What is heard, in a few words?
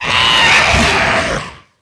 phoenix hit; DAoC